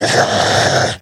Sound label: zombie alert